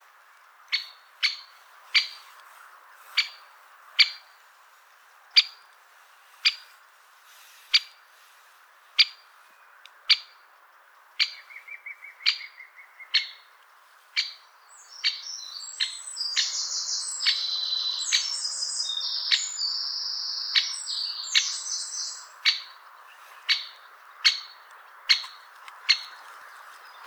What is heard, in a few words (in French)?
pic epeiche appel